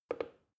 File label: plastic button start click soft fast